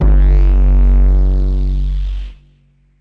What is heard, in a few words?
fuku bass